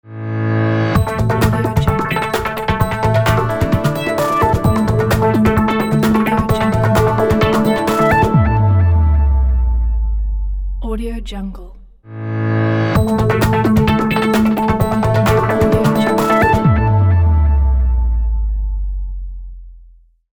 web marketing ident

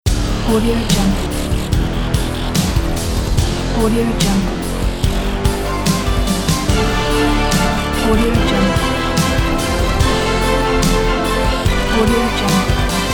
sports id bumper logo

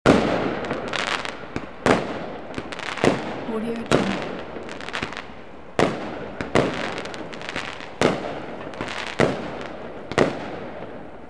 fireworks loop

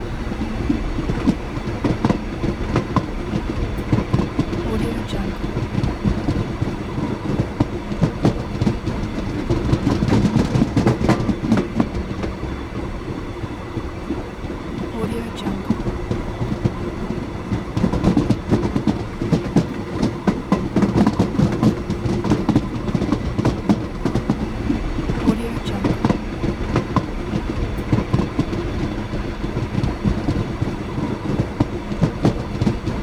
a train background

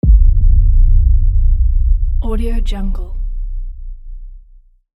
cinematic low hit; starmusicproduction

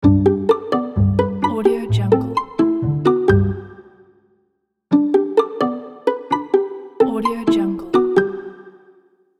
happy pizzicato logo

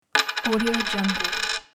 coin drop

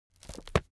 object book browse crackle

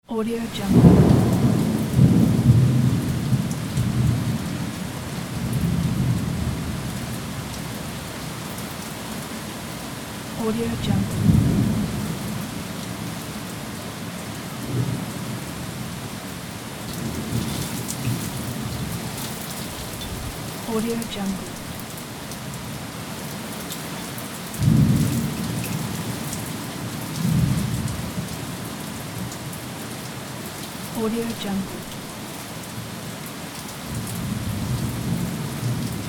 thunderstorm

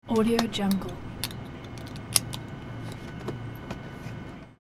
airplane passenger buckle seat belt

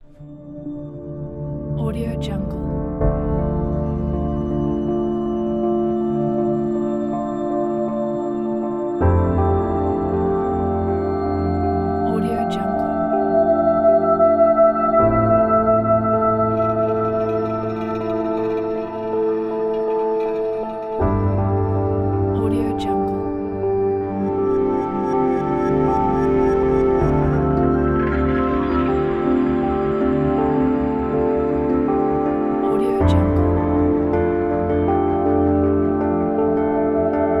documentary piano soundscape

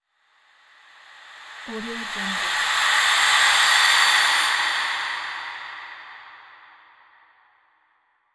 cinematic breath; cinematic breath sfx

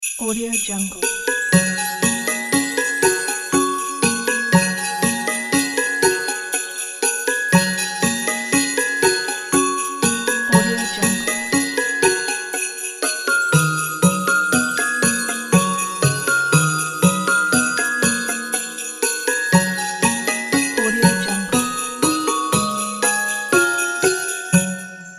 merry christmas logo pack

